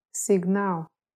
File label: signal; practice bulgarian